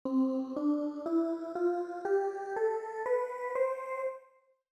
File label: Improv C Scale